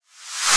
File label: white noise riser